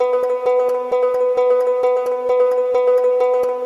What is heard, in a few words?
Chinese Zither 131 Bpm